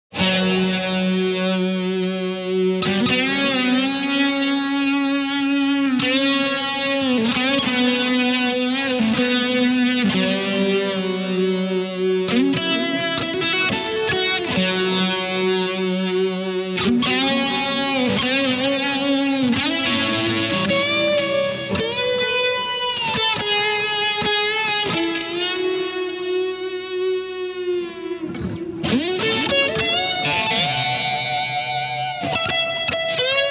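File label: solo Sovtek Big Muff before Tube Driver Reeves Bassman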